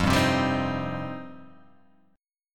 F 7th Suspended 2nd

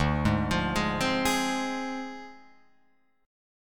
D Minor 7th Flat 5th Guitar Open F; Dm7b5; arpeggiated

